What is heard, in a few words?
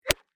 Golf Swing, Sports, Golf, Swing, 7, Iron; Nike; Golf Sound Effects; BlastWave